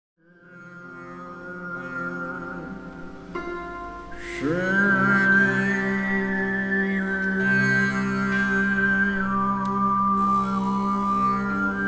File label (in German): Improvisation